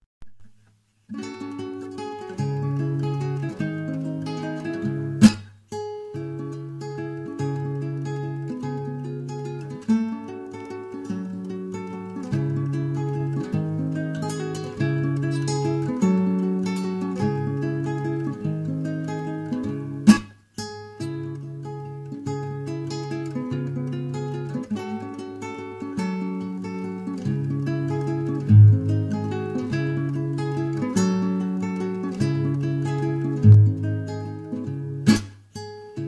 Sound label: INSTRUMENTAL